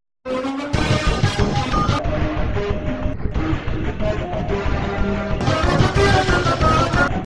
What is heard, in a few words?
Dream Music; Nancy Drew: Curse of Blackmoor Manor